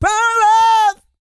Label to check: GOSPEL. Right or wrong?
Right